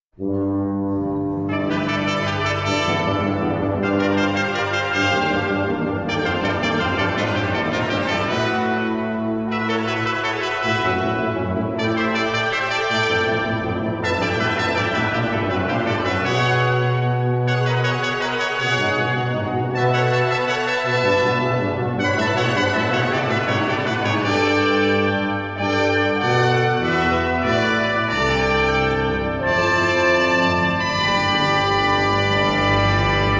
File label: Fanfare on Old Hundredth; Selah Recordings